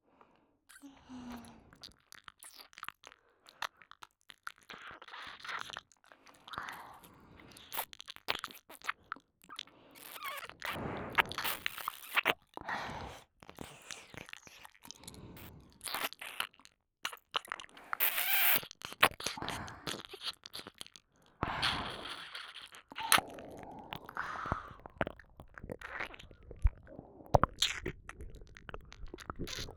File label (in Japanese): 耳舐め, ASMR